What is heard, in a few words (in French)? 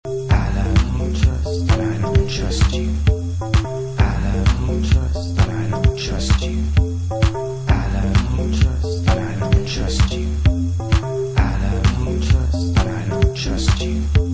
orgue electronique remix